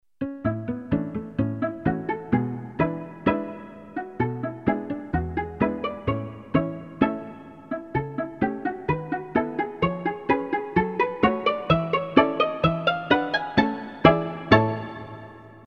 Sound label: korg m1r synth